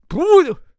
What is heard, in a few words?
Protector Attack Vocals